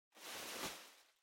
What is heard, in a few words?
Packaging Air Cushion Far Rustle; 梱包用エアークッション; 遠い ガサガサ; 梱包材